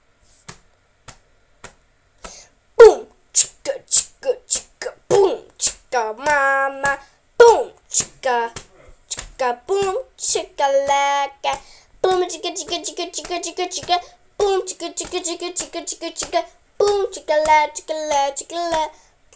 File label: beatbox